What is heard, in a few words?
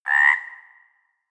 frog call croak